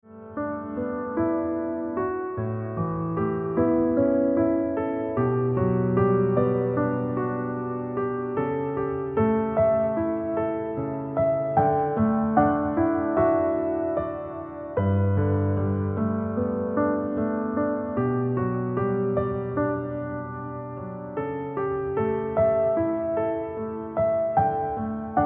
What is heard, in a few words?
Ringtone Piano Music